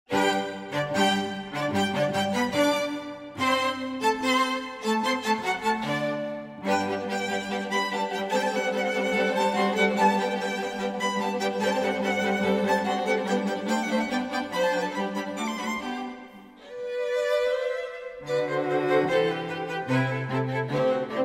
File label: CLASSICAL, Royalty Free Music; MOZART; podcast production toolkit